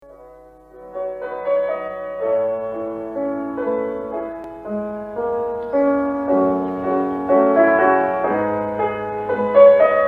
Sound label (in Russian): gimn buriatii